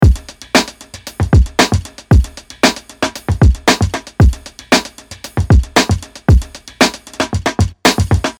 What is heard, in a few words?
Break Beat